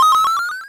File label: coin cluster